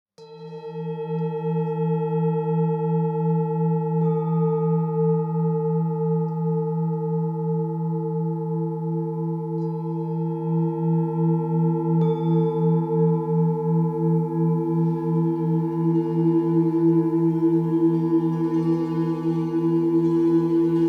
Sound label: Sound Massage 333 Hz